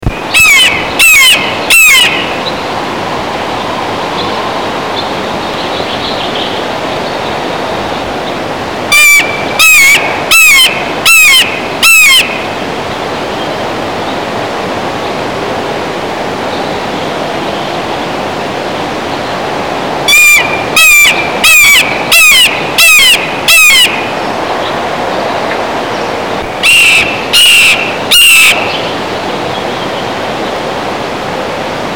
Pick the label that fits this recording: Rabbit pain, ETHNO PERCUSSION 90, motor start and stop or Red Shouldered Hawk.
Red Shouldered Hawk